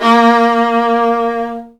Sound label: Virtuoso Strings; ILIO; Kirk Hunter Virtuoso Strings VOL-2